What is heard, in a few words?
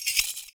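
PERC, percussions; Univers Sons - Raricussions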